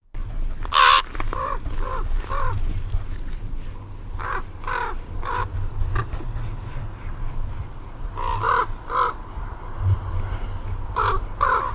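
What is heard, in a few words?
raven; korppi; birdlife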